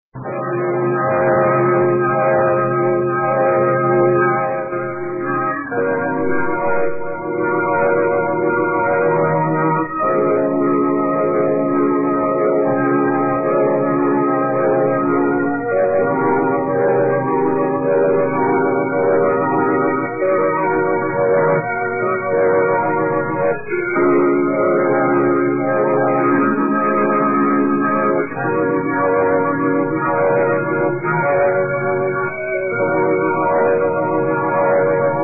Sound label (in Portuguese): orgao